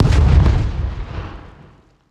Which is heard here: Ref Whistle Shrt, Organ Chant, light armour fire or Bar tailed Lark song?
light armour fire